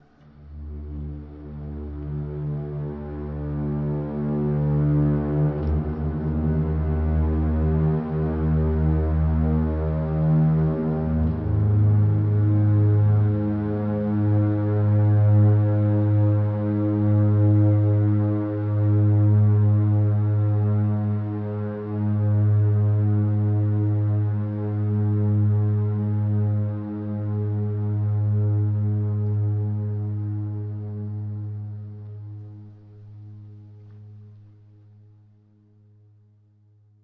ambient, vulcanus; Factorio

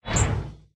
recycler mechanic; Factorio

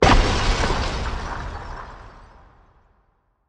asteroid damage oxide huge; Factorio

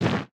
mech armor land bark; Factorio

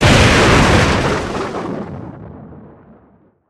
lightning effect; Factorio

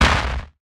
asteroid damage large; Factorio

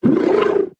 stomper death, enemies; Factorio

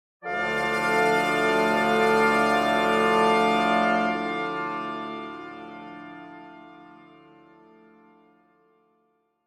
space, ambient; factorio

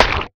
axe mining; factorio; boompuff

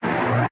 arm retract, asteroid collector; Factorio

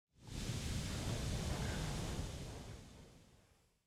lava; factorio

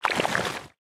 wriggler walk; enemies; Factorio

